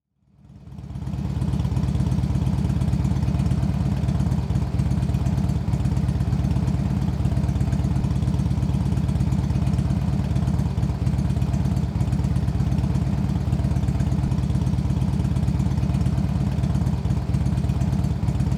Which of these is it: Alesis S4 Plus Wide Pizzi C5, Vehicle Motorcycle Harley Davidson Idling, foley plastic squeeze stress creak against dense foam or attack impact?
Vehicle Motorcycle Harley Davidson Idling